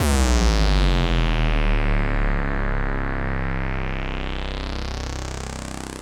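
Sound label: Rave Synth, Dance Mega Jungle Rave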